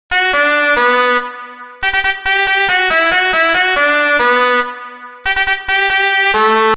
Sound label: Oboe